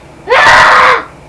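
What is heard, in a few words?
frustration; People; Sound Library 2000; Wayzata Technology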